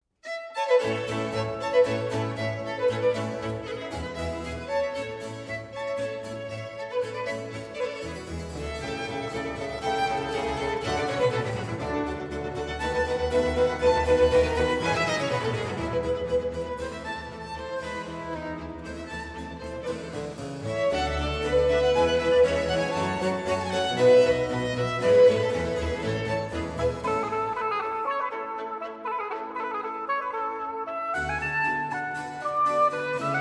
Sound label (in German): B-Dur 2. Satz; frantisek jiranek concertos